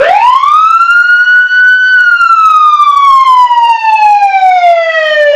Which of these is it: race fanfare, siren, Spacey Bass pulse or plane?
siren